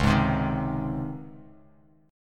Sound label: Db Major 7th Suspended 2nd Db Bass Standard; 9,11,10,8; DbM7sus2 strummed; JGuitar